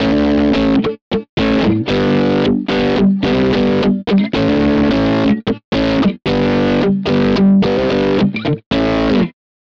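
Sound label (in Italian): amp, Esempio con simulatore, chitarra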